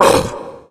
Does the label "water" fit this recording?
No